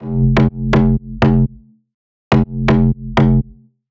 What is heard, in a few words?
Brazilian Bass upper